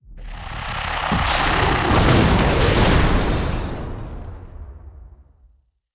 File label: Boss Skill Multiple missile